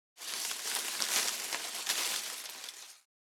Bush leaves foliage movement

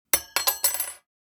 Kitchen utensils drop clatter rummage

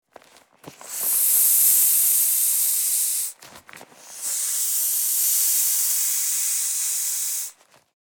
Rubber ring deflating air release; inflatable

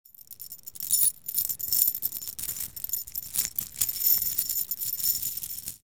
Tiny chain movement and rattle

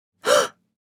Female shocked gasp

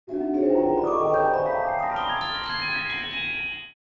Old vintage cartoon ascend

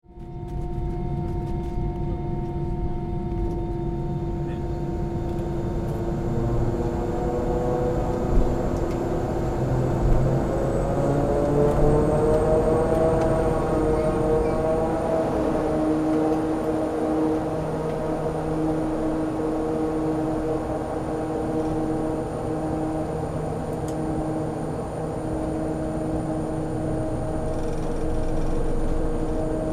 Airplane ambience inside take off

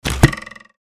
Bow and arrow shot army archery

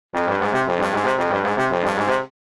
Old vintage cartoon accent

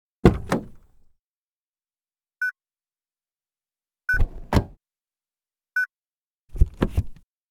Car interior foley door beep signal lock

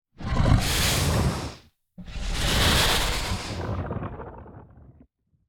Dragon sniff breathy inhaling and exhaling

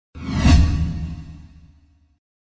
Sci-fi transition fast whoosh

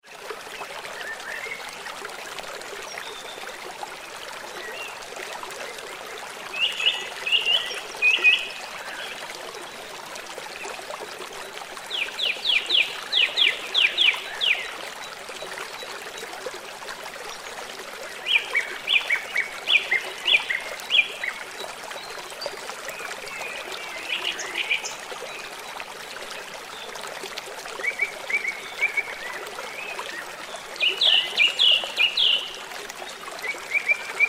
Waterfall river creek bird chirps in foreground

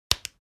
Casio calculator single button press

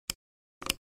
Mouse drag and drop click

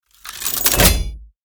Mechanical reload with metal Impact; steampunk; gfxsounds

